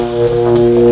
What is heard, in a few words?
Electric Arc; 1997 MMX LAN PACK (win 95); Army Men